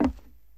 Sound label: wood, player footsteps; hl2